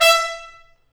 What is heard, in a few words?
BRS TP STA; Roland L-CD702; BRS Tps Stabs